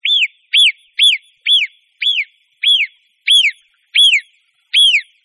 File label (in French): cri alarme terrestre